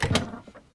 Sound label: Backpack Close; Custom UI Sounds; Resident Evil 2 (2019)